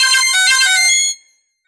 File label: cyberpunk alarm sound